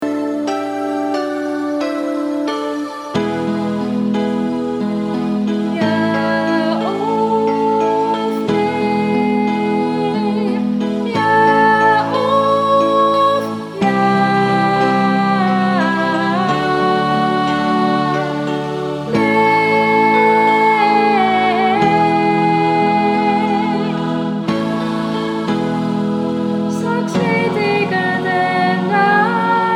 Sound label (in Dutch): oefenband alt groep